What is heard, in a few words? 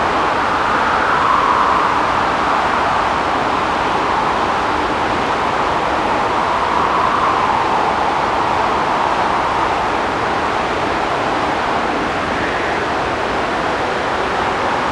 Strong Wind; UltimateFishing2020